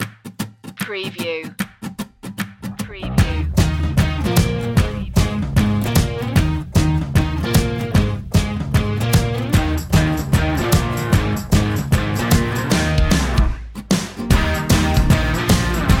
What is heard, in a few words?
get down no vocals